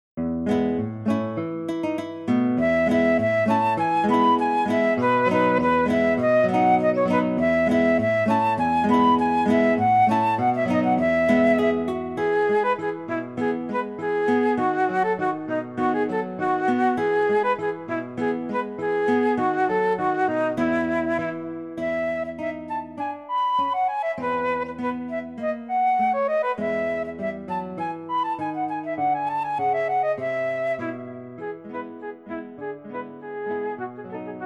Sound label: flute, folksong; bobby shaftoe